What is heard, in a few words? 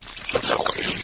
move, flood infection